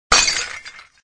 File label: Trash Can; penguin dinner